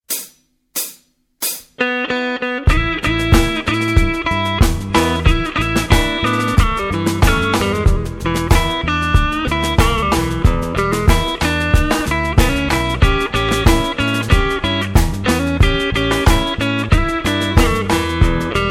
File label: blues jazz